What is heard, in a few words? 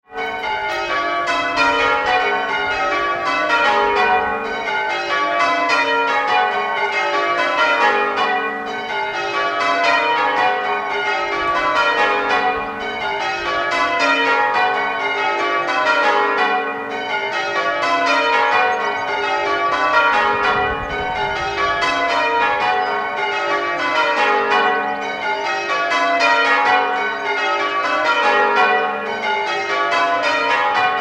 Worlingworth, bells